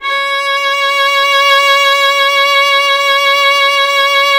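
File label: strings viola C; Miroslav Vitous; Solo Instruments VOL-1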